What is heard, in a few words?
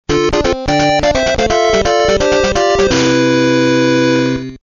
Game Over; Kirbys Dreamland